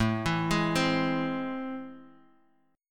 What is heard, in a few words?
A Minor Major 7th A, Guitar, DADGAD